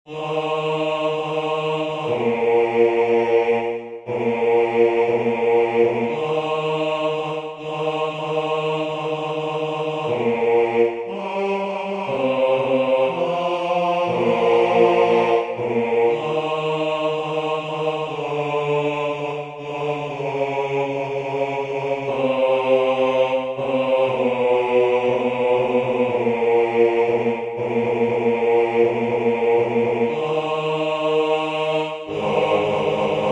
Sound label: Priere du soir Bass; Gounod